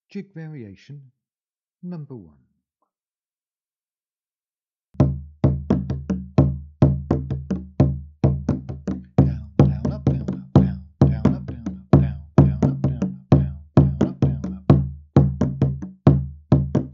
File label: jig variation, Bodhrán; bodhrán tutorial jig and variation